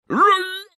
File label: vox short Guy throw; Highland Reels